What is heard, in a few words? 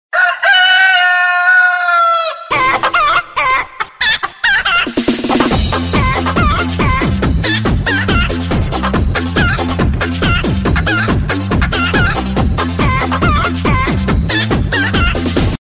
funny chicken ringtone